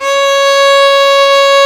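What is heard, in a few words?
C#5, strings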